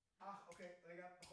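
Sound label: Vocal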